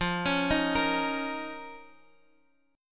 F 7th Suspended 4th Sharp 5th F down Guitar Half Step Down; F7sus4#5